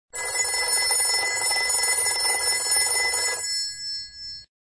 Traditional Alarm; Pantech P2030-Breeze-3; Alert Tones

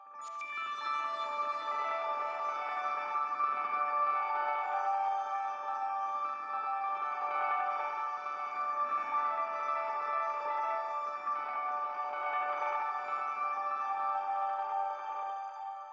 alarms abstract; Amazon; Fire-Phone

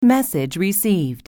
Message tone; LG UX280 Wine

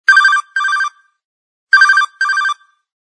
Move Bell

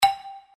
dial tone marimbas; OnePlus One China

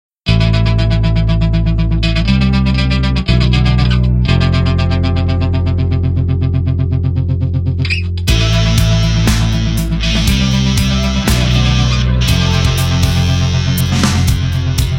phonetones Nokia

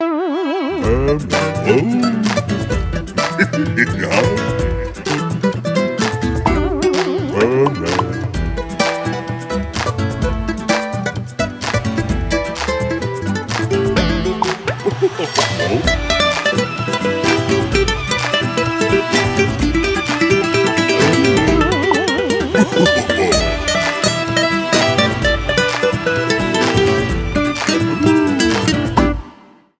ring, Soul; LG F520L AKA

